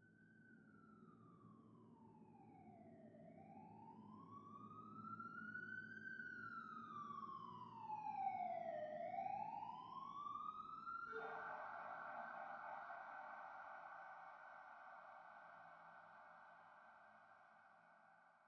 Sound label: police distance, city; soundscapes